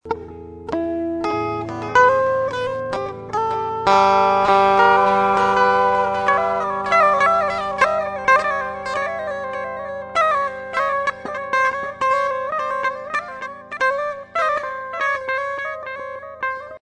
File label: Blues